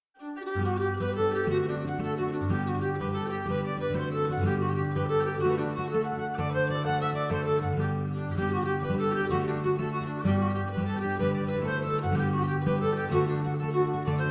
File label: Jig; Father Kellys